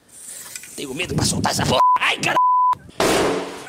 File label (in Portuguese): bombinha